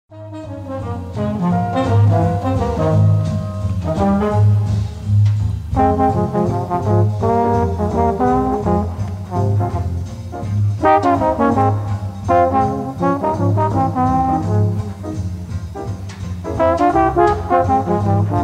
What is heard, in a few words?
Duet